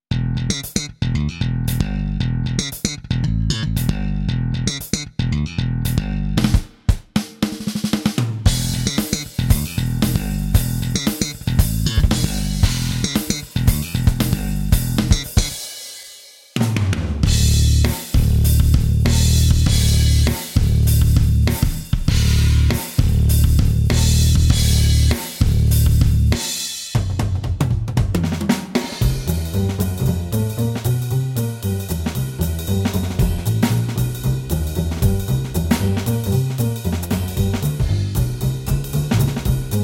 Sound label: BOSS Dr. Rythm DR-880 Internal